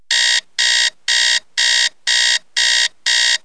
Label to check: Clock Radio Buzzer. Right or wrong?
Right